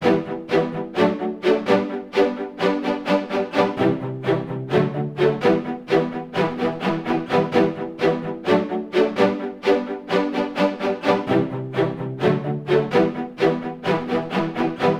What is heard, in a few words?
String Loop, 128 BPM E min; Instruments; Loops; House